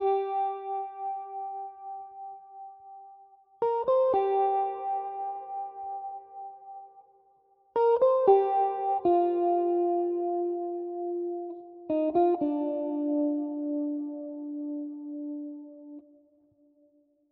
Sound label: 116 BPM C Min Guitar; Loop Stems; Cymatics; Encantadora